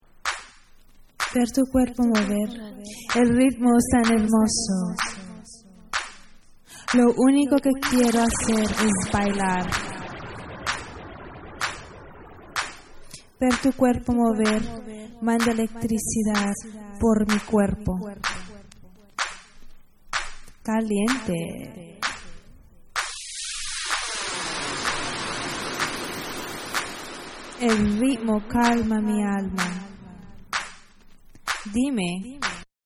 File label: Accapella